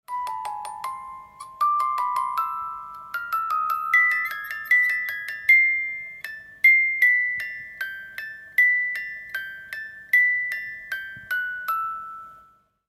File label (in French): marche turque, boites musique